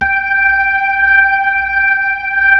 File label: B-3